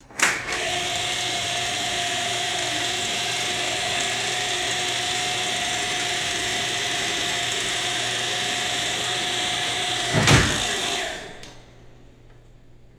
steady electric motor whirring and stopping

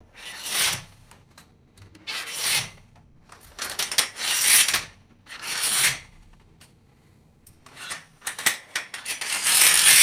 squeaky venetian blind rod turning; foley

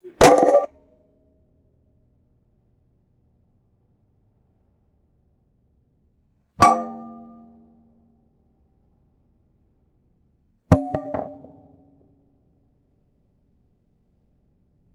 hollow steel object dropping and ringing

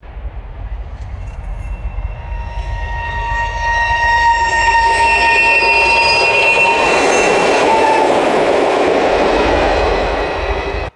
high pitched metallic train squeal pass by

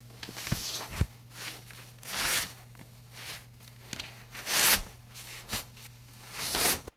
cloth gloves being pulled on; foley

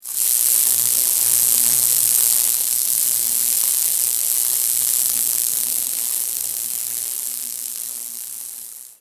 sustained crackling electrical discharge buzz; electricity

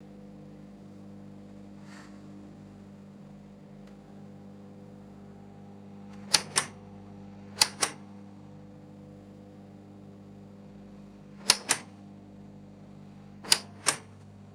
heavy cabin light switch clicks, boats

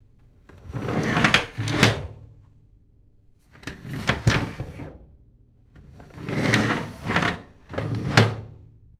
gritty metallic appliance scrapes and rattles

